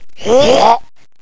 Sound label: jump; critterz